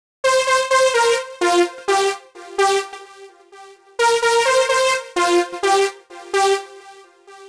128 Synth, 128bpm; Nu Dance Electro; Zero-G; Soundclash; Combi Loops; Dusseldorfer

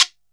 GUIRO SHOT; CUBA

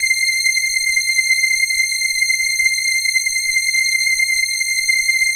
HIGH STR, House Dance

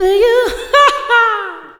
LAUGH, Funky Vocals, ADLIB WOM; USB Soundscan vol.18

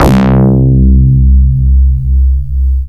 Single Electric Basses, Dance Floor Bass

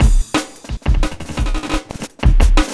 Glitched Beat 175BPM; Beats; glitch kit

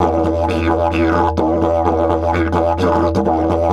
E 130, Didgeridoo

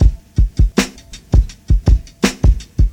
breaks

